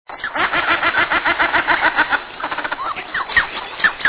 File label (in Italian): volpoca